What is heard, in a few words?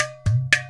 INDIAN DR, INDIAN PERC; Spectrasonics - Heart of Asia CD2